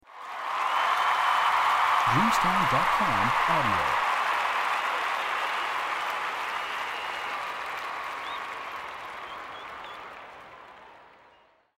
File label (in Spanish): aplauso estadio